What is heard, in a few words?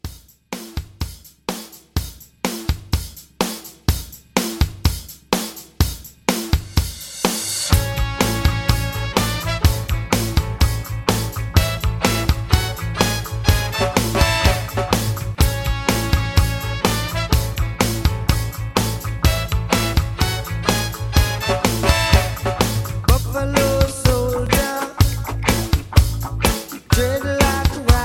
80s Reggae Re Drum 125